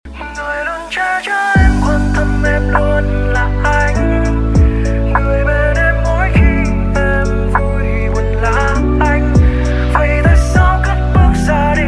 Nhac Chuong Lofi

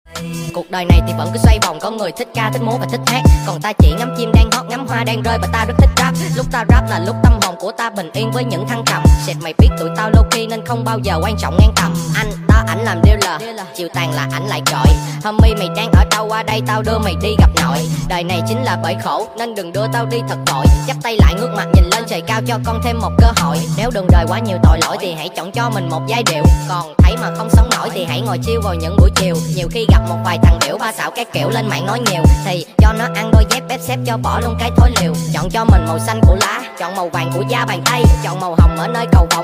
nhac chuong lang rap

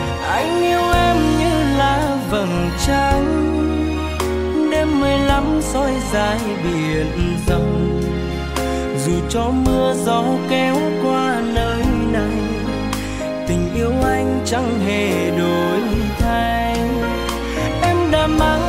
Nhac Chuong Cover

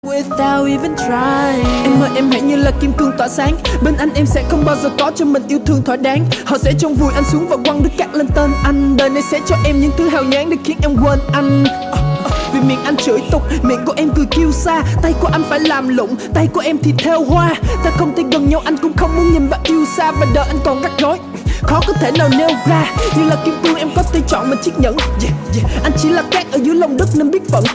nhạc rap

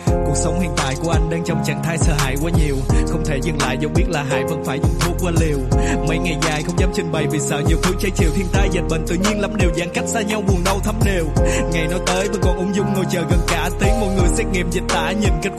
Nhac Chuong Chi Thi Buon Rap

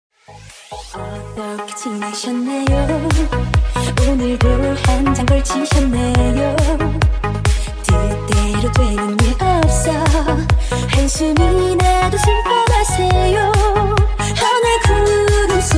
Nhac Chuong Remix Tik Tok

